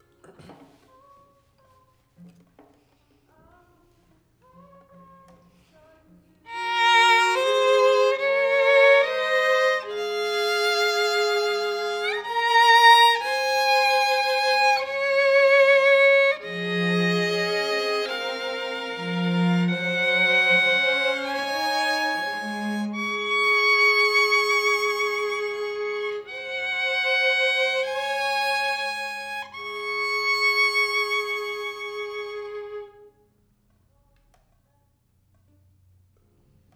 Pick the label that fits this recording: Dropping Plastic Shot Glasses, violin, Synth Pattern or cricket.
violin